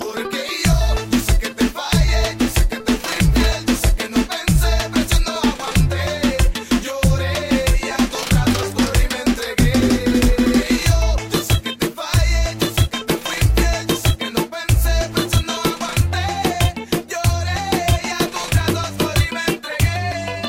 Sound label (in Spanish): reggaeton cristiano